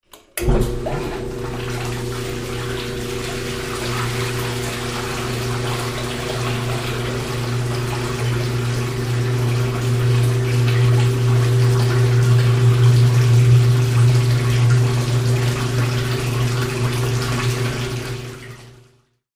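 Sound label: Washing Machine Rinse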